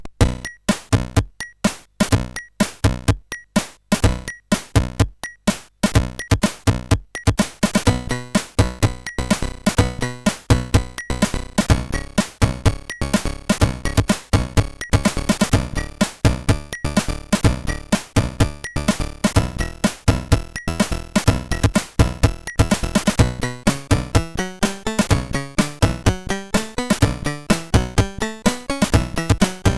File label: Test in Minor, CSG8580R5